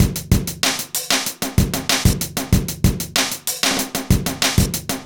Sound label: kit; Sound Effect Library